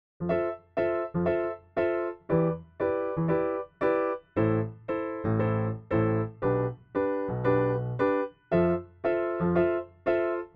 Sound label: Test Damping Duration half; Pianoteq